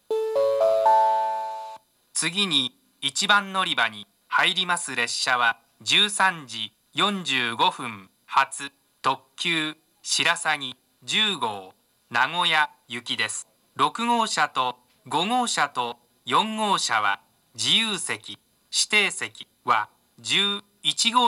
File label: kanazawa limited express; shirasagi10; jr-west